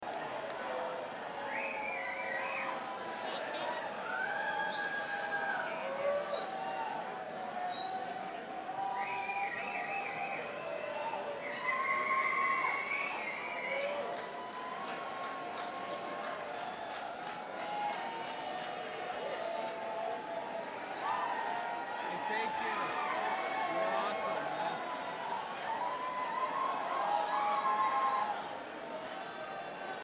Crowd

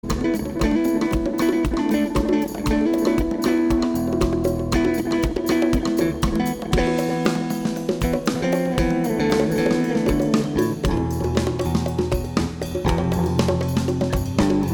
Drums and Bass

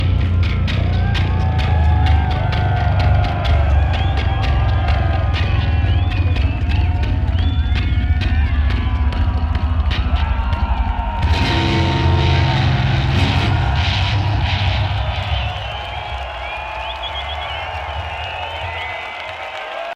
Bass Solo

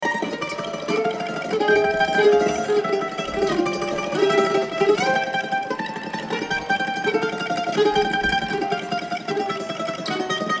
Kentucky Mandolin

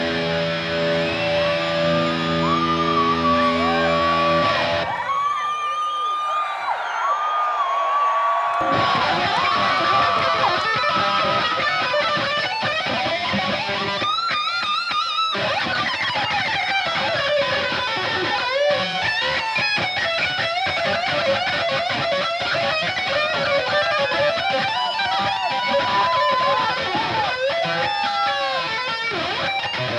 Guitar Solo

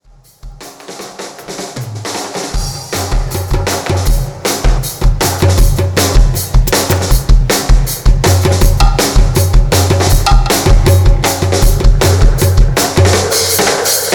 Drum Dub

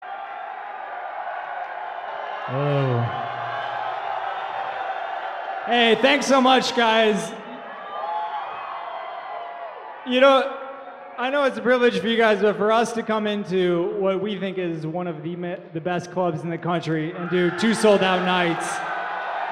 Crowd Banter

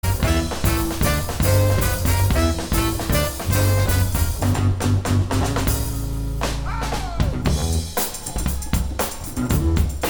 Funky Soul

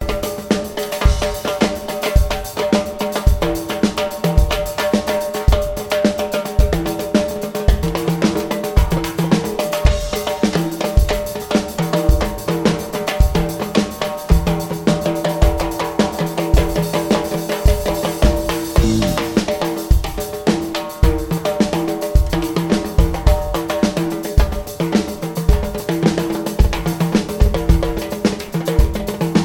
Drums

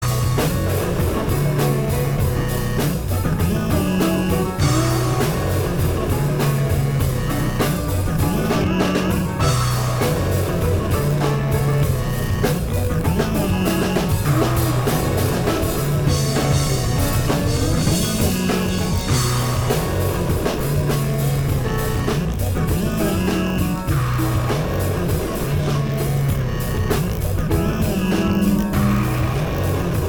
Improv Jam

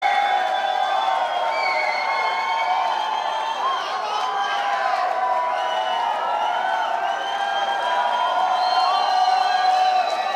Banter Crowd

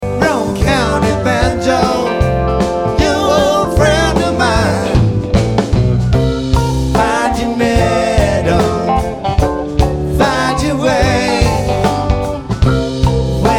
Banjo

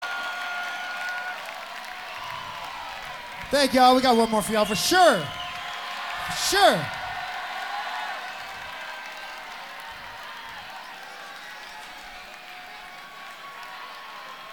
Applause

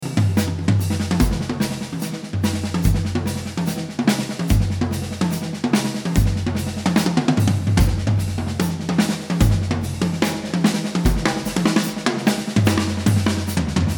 Drums

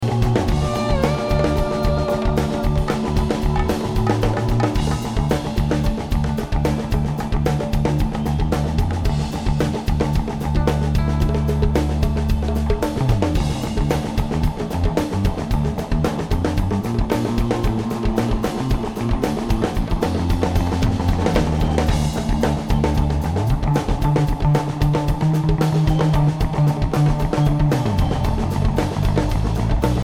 Drums

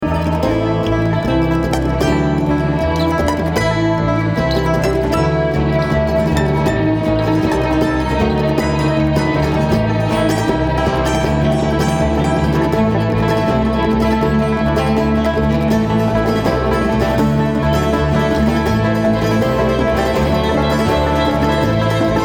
Traffic Jam

